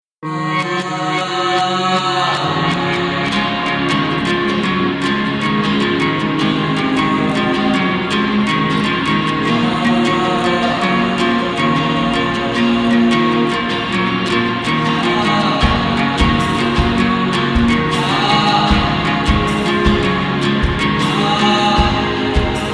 sad soul

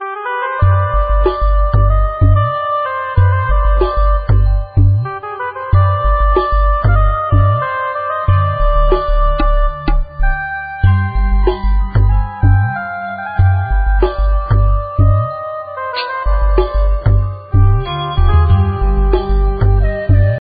Mystic tone

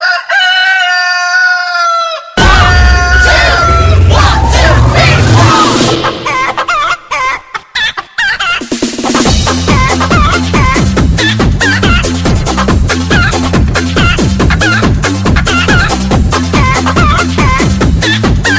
chiken

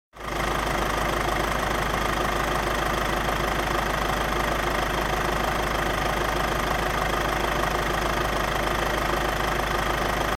Diesel engine sound

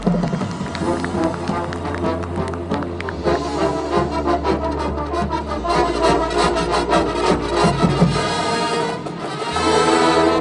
Brass

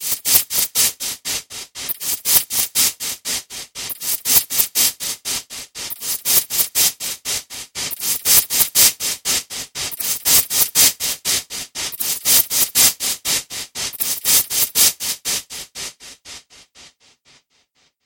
Echo Signals

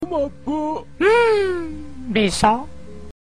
hmm hmm, hip hop ringtone

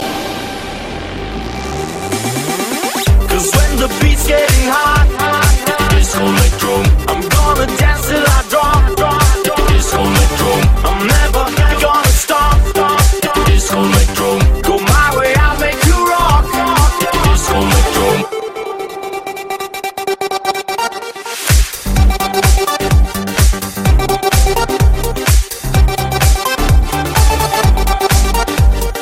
RNB

